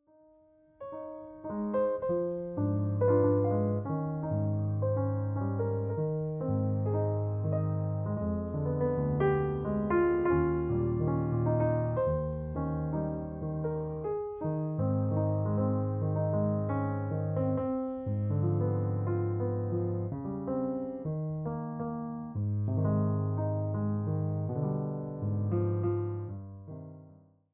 Etudes de Concert in A-Flat Major, piano; S.144 No. 1; Il lamento; liszt

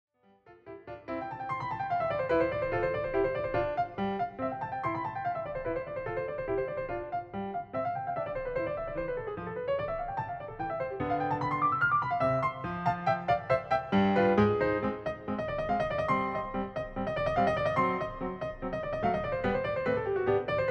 Keyboard Sonata in F Major Moderato, piano; Keyboard Sonata No. 38; Hob. XVI, 23; haydn; Piano Sonatas 35-38

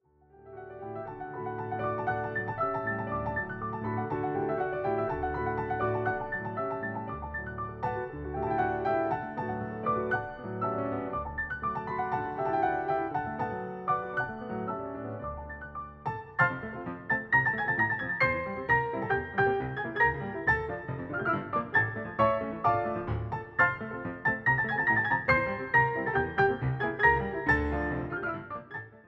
Hungarian Dance in D Minor Presto, piano; brahms; 21 hungarian dances; WoO 1

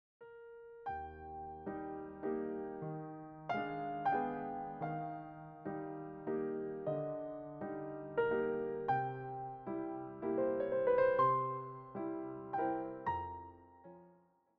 Nocturnes In E Flat Major, piano; chopin nocturne selections vol 1

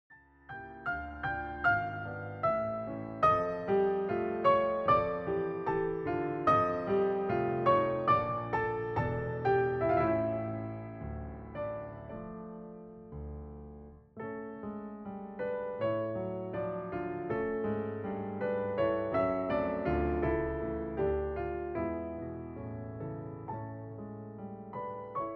Hungarian Dance in D Minor Un poco andante, piano; brahms; 21 hungarian dances; WoO 1

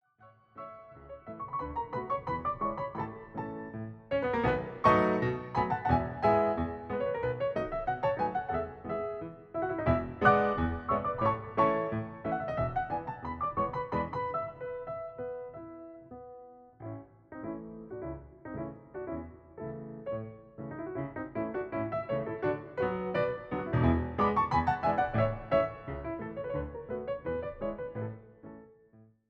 Hungarian Dance in A Major Allegretto Vivo, piano; brahms; 21 hungarian dances; WoO 1

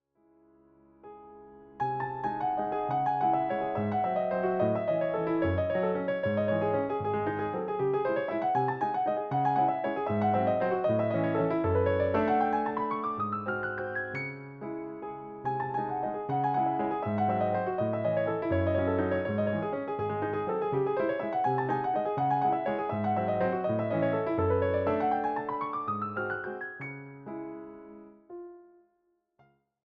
Waltz in C-Sharp Minor; classical masterworks vol 1

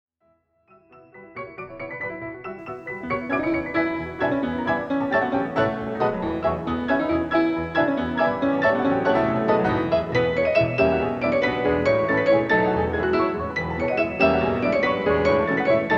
Hungarian Dance in E Major- Presto; brahms; 21 hungarian dances; WoO 1

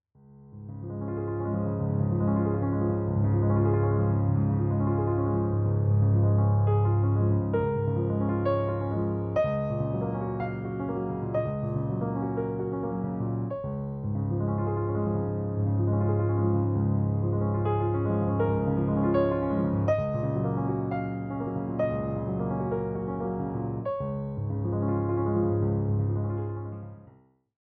Etudes de Concert in D-Flat Major, piano; S.144 No. 3; Un sospiro; liszt

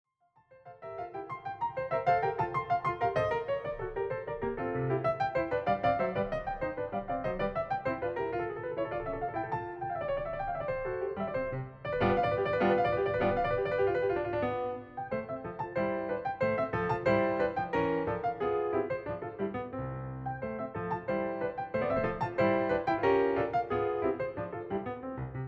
Keyboard Sonata in F Major Finale Presto, piano; Keyboard Sonata No. 38; Hob. XVI, 23; haydn; Piano Sonatas 35-38

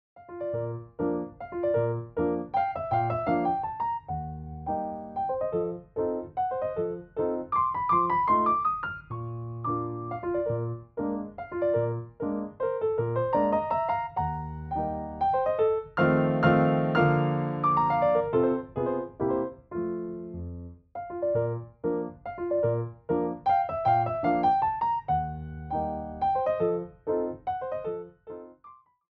Scherzo in B flat major, piano; classical piano favorites from the romantic period vol 1

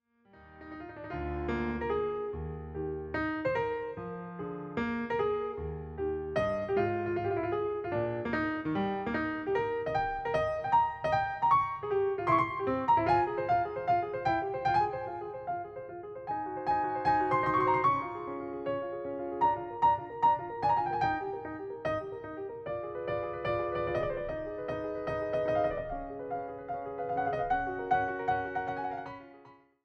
Keyboard Sonata in A-Flat Major Moderato, piano; No. 35; Hob. XVI, 43; haydn

